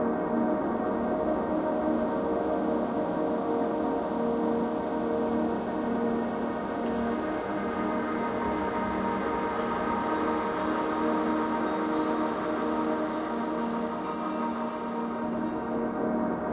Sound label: Tunnel; Mirrors Edge